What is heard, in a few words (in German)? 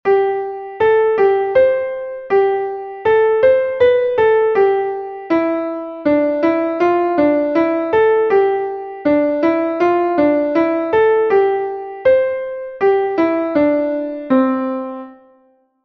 klavier; MelodieMeister